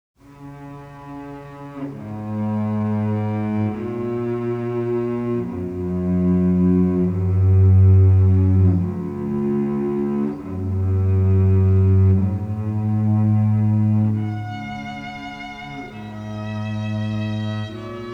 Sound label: Strings Cannon in D; Pachabel